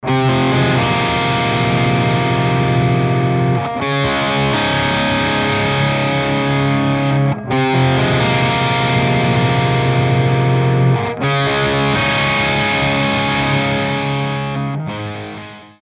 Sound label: alnico cream git; kraken